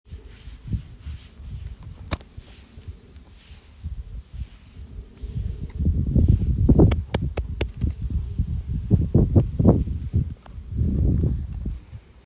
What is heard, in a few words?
Petting a donkey